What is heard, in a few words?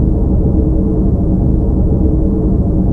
transporter room; trek